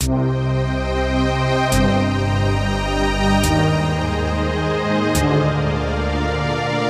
The music you will play on this page is called a loop. The music is designed to loop back to the beginning as one continues; On-Hold Audio System message; soothing